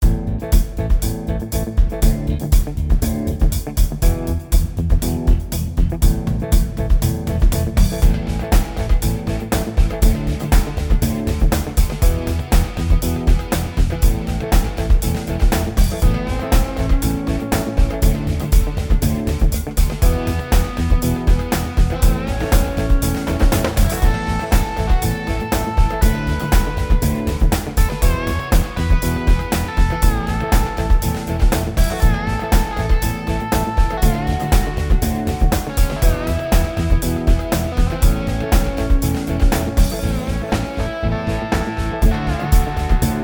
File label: I IV V I Rock A